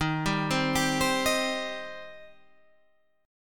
Eb 6th Eb down Guitar Standard; Eb6 arpeggiated; JGuitar

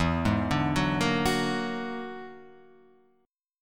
E 9th Flat 5th E down Guitar Half Step Down

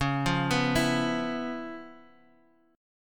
Db 7th Sharp 9th, Guitar, Half Step Down

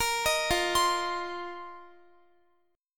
F 7th Suspended 4th F down Guitar; arpeggiated

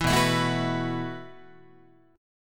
B 7th Suspended 4th B down Guitar; B7sus4; strummed; JGuitar

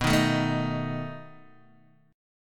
B 7th B down Guitar Open D

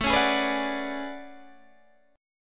A Major 13th, Guitar DADGAD

